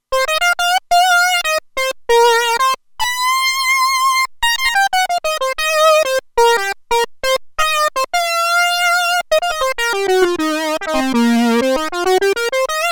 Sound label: Lead, Roland Alpha Juno-2; Original Sound Chart patches